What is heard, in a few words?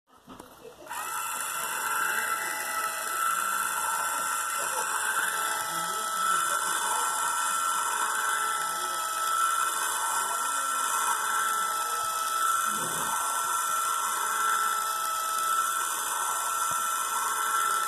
noise